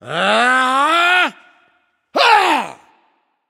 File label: battlecry; smite; voicepack; khepri